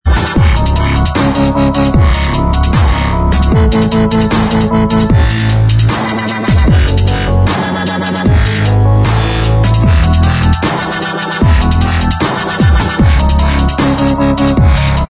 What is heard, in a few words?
dubstep remix Inst